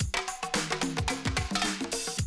110, Classic Drum Loops

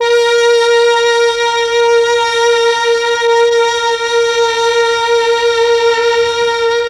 MEL STR, Legendary M400; ILIO; Propeller Island

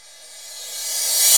WHOOSHES; Megabass Remix! 2

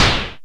ANASNARE; Back In Time Records; Big Bang; SNARES

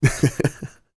LAUGH; Spectrasonics Vocal Planet; Jazz FX

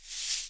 SHAKER, Percussion; Miroslav Vitous - Percussion & Harp

